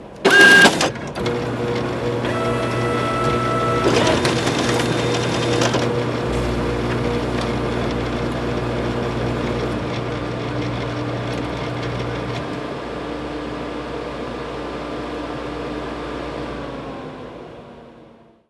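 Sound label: machine photocopier run; Call of Duty Modern Warfare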